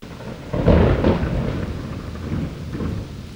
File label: thunder; weather